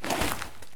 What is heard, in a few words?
prone concrete; Escape from Tarkov